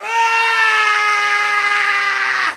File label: male scream; Voice; Human